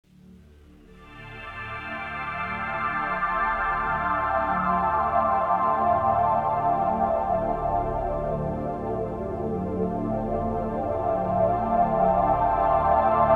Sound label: k4 swell choir